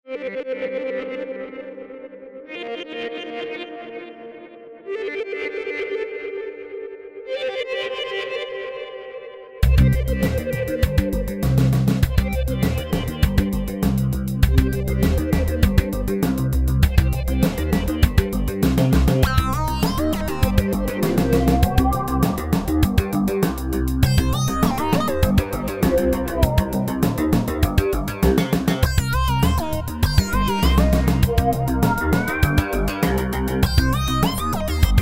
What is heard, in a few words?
Octaves